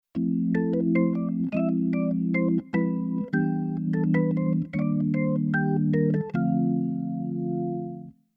Keyboard Organ Bossa Organ

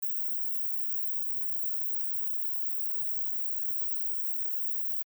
Dither Noise On Shaping

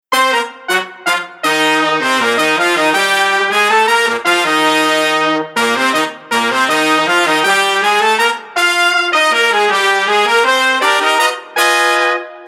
Horn

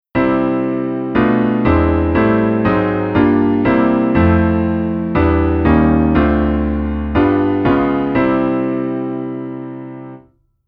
Chord Pad; ABILITY

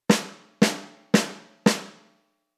Jazz Stick SD, Rhythm

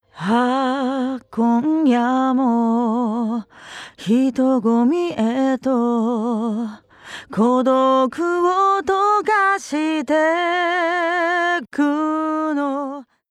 Distortion Over Drive Before

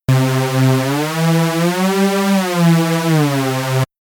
synth glide long

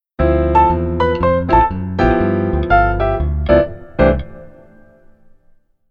Keyboard Timing

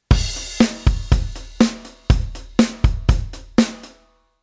Midi Basic Quantize

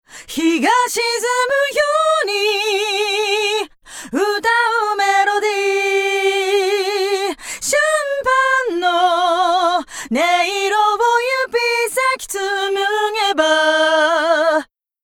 Chorus Flanger Chorus

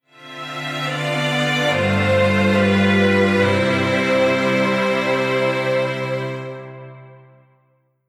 Strings Poly; Hamo

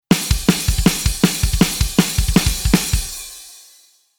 Rhythm Metal

